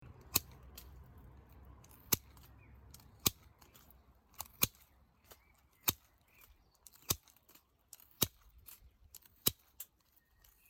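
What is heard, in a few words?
pruning shears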